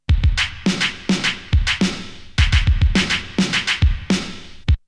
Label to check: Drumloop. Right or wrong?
Right